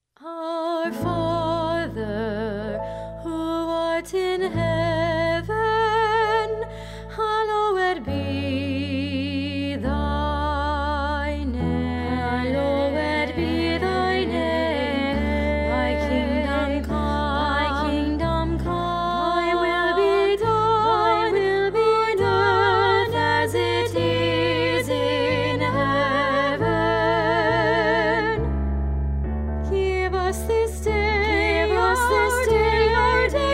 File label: duet